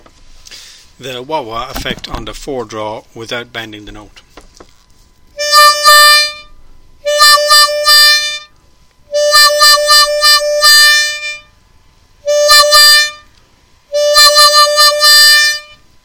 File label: wah wah, harmonica